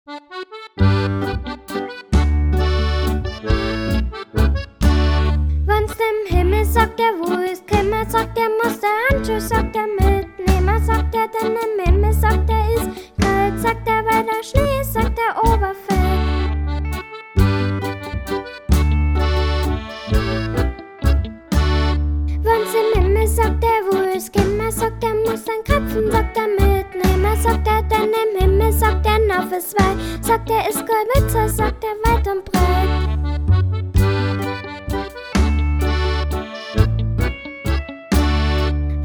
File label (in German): schnaderhuepfl voc